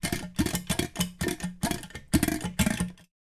household bathroom sink plug pop up style push multiple times